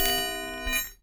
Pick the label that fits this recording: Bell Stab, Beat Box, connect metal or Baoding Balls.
Baoding Balls